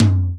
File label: tom mid; osu